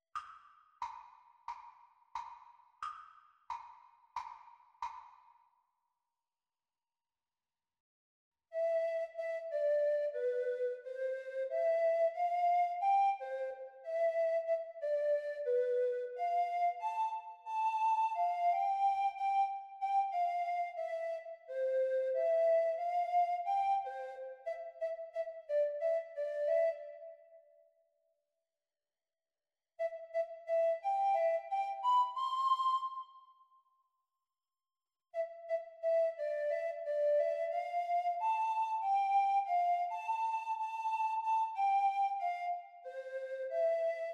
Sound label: Treble Recorder; Grade 6; practise speed